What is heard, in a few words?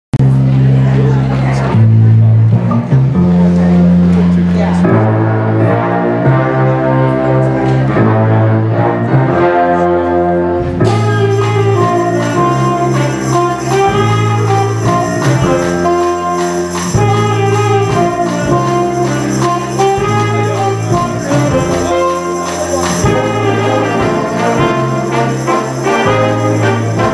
senior high jazz band; gacs artist extravaganza 2013